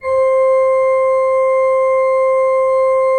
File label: C4, Cathedral Organ; ILIO; Propeller Islands Cathedral Organ